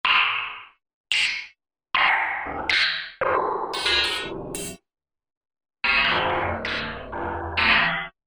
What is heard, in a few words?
Switch; Korg MSC-14S Sound Effects